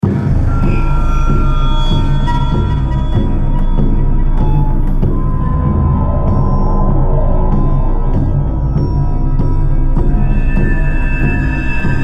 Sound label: Battle music; RadwinIsland